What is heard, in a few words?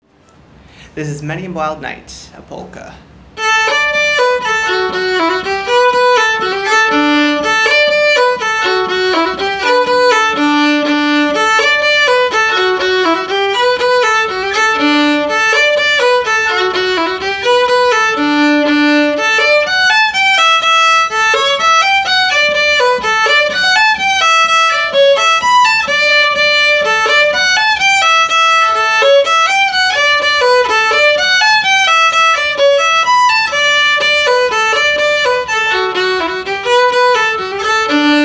Fiddle Many a Wild Night Polka Faster